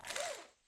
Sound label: Hang Player On Ceiling